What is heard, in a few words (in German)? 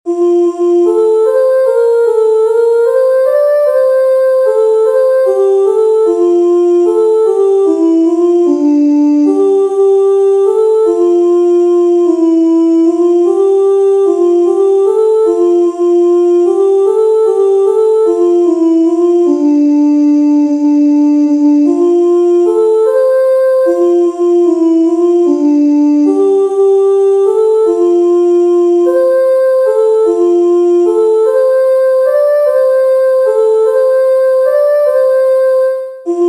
sopran; quempas